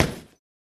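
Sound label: Boxing Bag Hit Short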